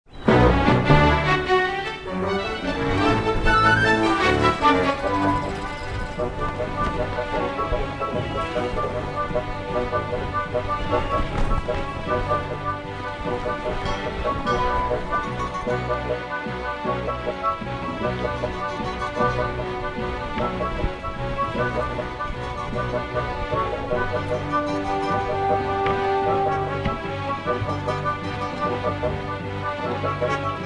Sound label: Swordfighting; MI3